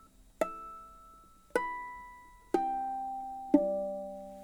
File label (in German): flageolett, ukulele; koaloha